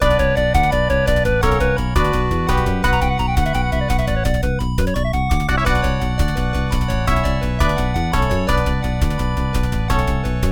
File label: Toy Stage Boss Loop; ravva and the phantom library